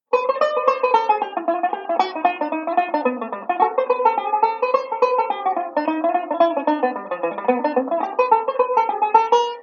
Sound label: I V I Etude jazzy, banjo; Beyond Chord Melody Volume II